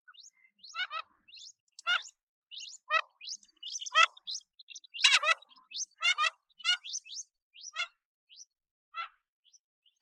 oshidori, オシドリ, 鳴き声; 地鳴き; 頭は横からはさんだように扁平で、派手な冠羽など美しい色彩の羽毛を持つオスは、色彩の地味なメスと一緒にツガイでいることが多く、オシドリ夫婦の名の由来となっています。; 全長 45cm（オス） 41cm（メス）; 日本の鳥百科; サントリーの愛鳥活動

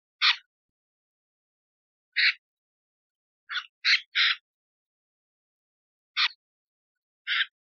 tashigi

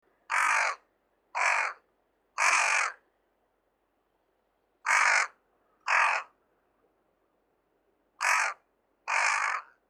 hashibosogarasu, birds

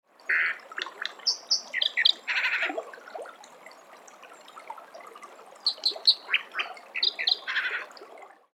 kawagarasu; さえずり; 全長 22cm 渡り区分 留鳥

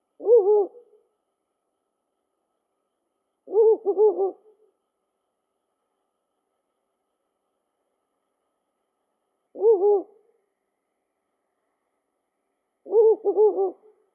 fukurou

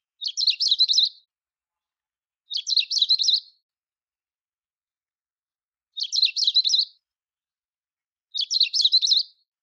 benimashiko

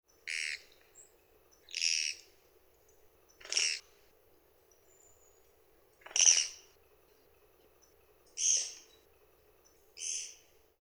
kogera

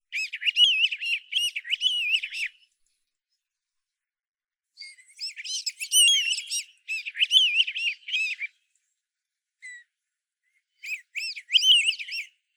munaguro, birds

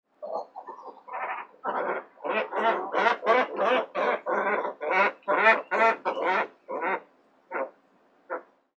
kawau

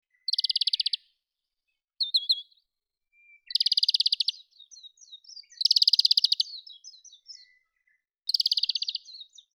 kawarahiwa

